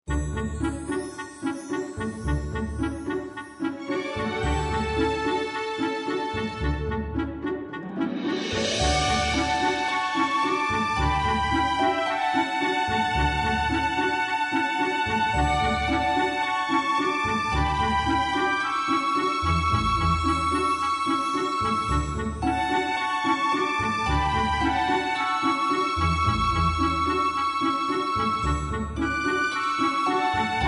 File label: Romantic Comedy